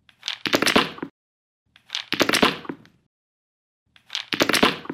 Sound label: banging, refrigerator; Samsung